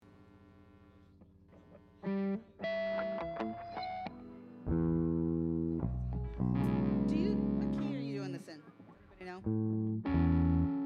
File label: Strings Mix; breakdown